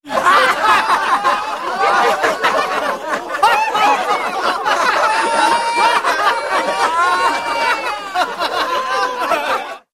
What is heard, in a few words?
LAUGHTER CROWD; Rosegarden